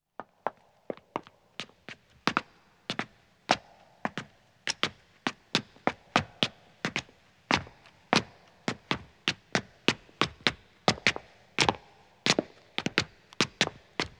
Stone Stairs (Echo) Ascending, Footsteps; BBC Sound Effects Library; Footsteps II

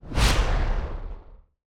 Whoosh, Blast, Watery, Crash; Sound Design

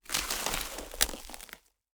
Log thrown on wood bunch; Wood Impacts; Red Libraries

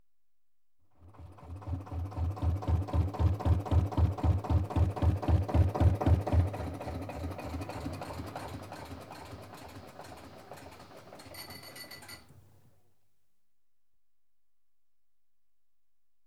Canal Narrow-Boat, Engine Stops; Ships And Boats; BBC Sound Effects Library; Ships And Boats 2

